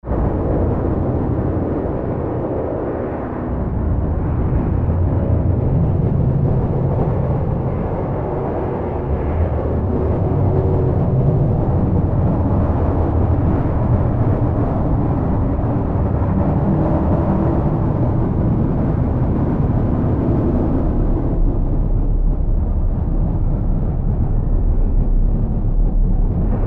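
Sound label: metal Reverb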